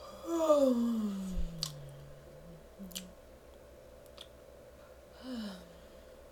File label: Morning yawn and lip smack